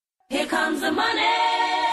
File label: money